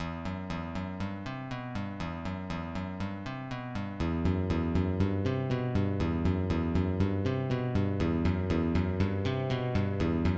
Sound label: traffic